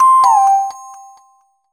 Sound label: warning